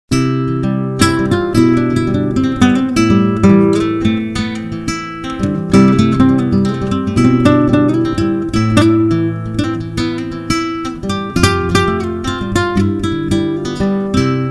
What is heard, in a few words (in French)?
Balade instrumental